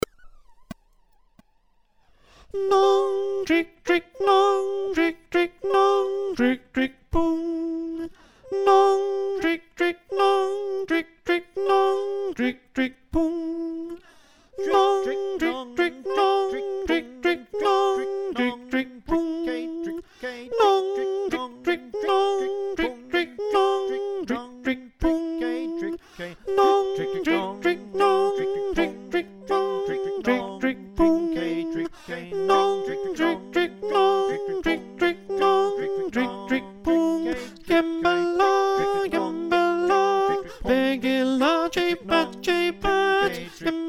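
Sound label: alto, choir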